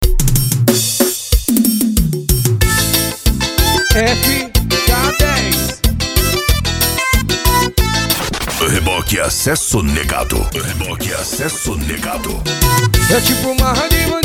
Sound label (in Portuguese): sertanejo e forro